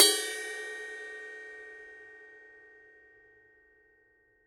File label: brass 20 ride bell